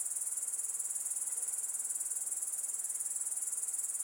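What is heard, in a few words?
Env Grasshopper Mono Loop, Hong Kong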